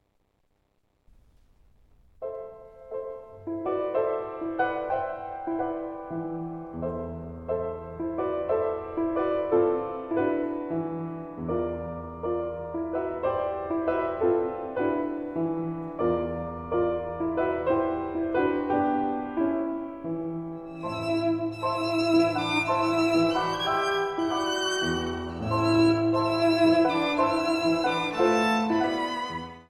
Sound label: Piano Trio in A Minor; Ravel; I Modere; Furuya Trio